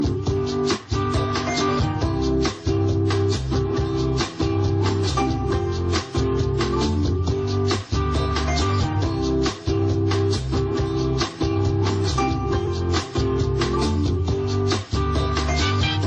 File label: Mexico Mexican Ringtone Mexican Ringtone